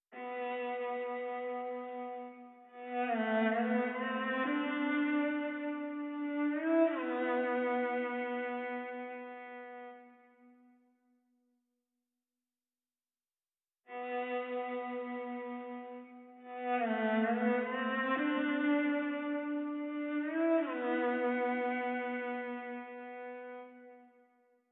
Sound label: 140 BPM A Min Viola; Cymatics; Fearless; 2022 Melody Collection; Drill